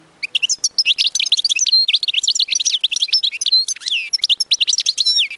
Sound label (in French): Chardonneret